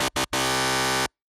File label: Quiz Wrong Buzzer